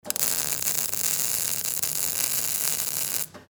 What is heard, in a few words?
welding electrical